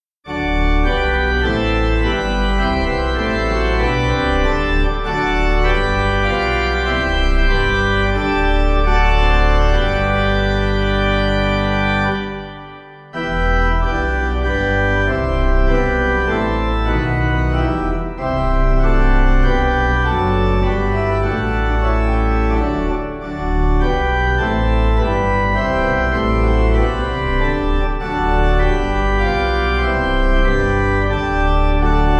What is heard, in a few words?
Organ, hymn; He sat to watch o'er customs paid; Winchester New; Hymnal 1982